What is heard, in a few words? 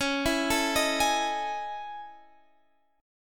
A Major Sharp 11th C sharp